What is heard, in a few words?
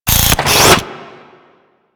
Drone Face Shield Open; Warframe; Vay Hek